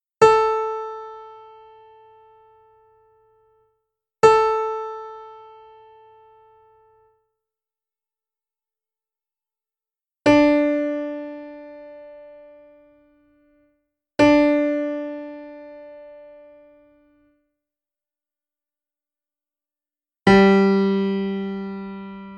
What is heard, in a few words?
Tuning track, violin; heypr for violin bk 2 silver